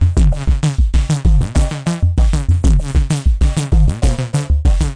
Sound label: flybuzz funk, 097; SYNTH AND ELECTRONIC LOOPS